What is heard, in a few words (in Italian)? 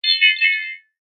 ciottoli reson; acque